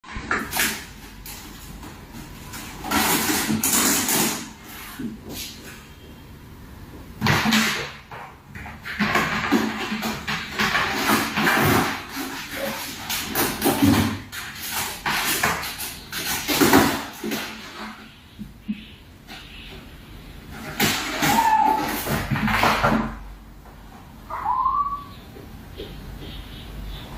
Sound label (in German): 2 junge Katzen